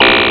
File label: birds